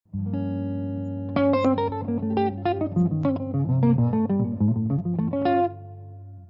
Locrian nat. 2 Jazz Lines, guitar

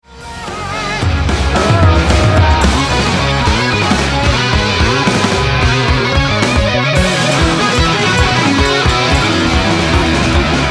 interlude bpm 111

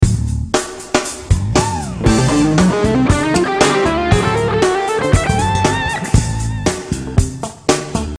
Lick

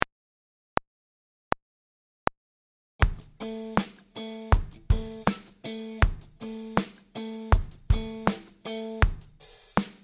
groove

